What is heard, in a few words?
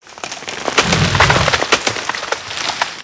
treefall creak; World of Tanks Blitz